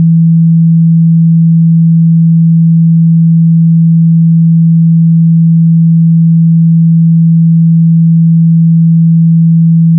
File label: sound color Button